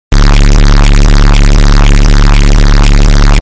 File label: Bass Boosted Sound Effect